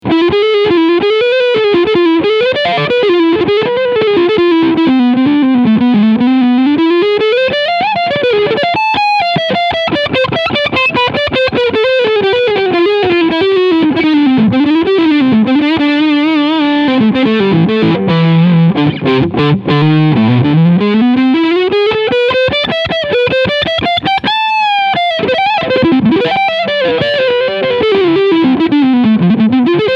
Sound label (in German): PRS McCarty 594 E Gitarre Overdrive beide HB SC Modus